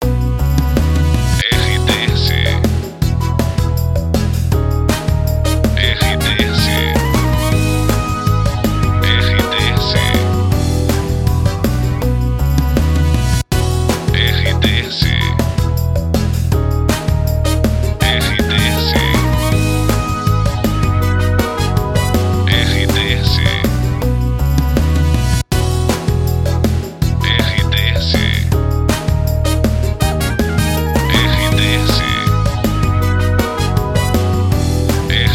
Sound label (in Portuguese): trilha para locução; Prata FM 2021